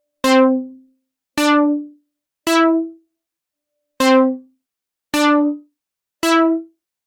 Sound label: fm synth